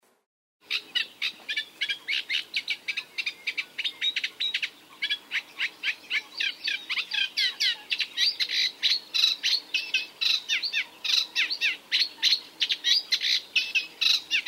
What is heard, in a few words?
reed warbler acrocephalus scirpaceus